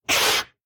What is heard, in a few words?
biter roar; factorio; Factorio 0.14.21; creatures